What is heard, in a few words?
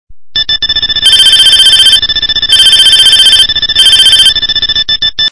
delkim alarm